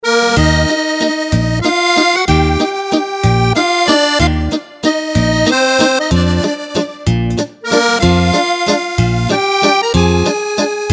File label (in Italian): Elettrico, fisarmonica